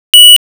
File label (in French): bip